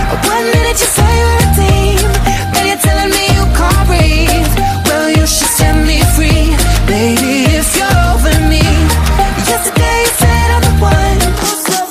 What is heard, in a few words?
hot electro